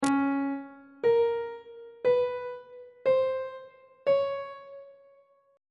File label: c sharp 7th intervals on treble clef